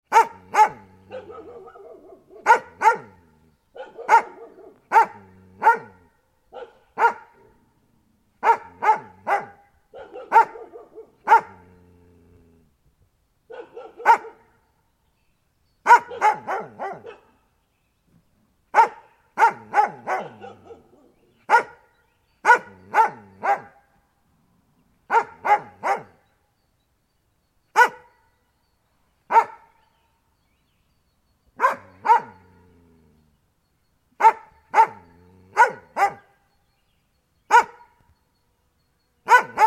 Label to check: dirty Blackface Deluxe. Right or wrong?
Wrong